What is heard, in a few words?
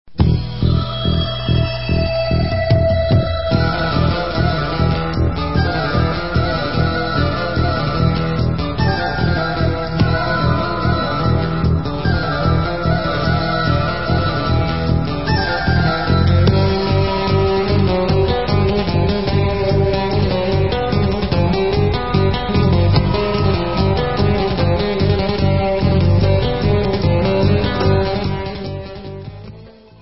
Debka Instrumental